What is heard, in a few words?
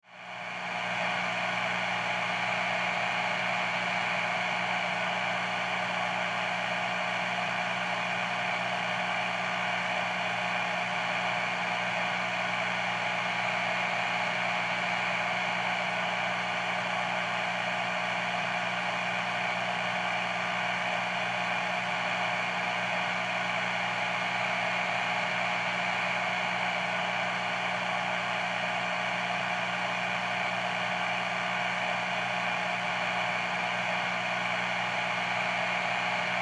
Foley Guitar Amp Hum; Guitars; Musical